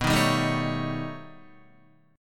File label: B Augmented 9th B Guitar, chord; 6,6,7,8,9,9; strummed; JGuitar